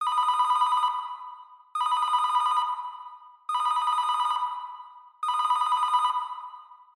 Telephone Ringtone

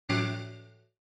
OS Sound Notification

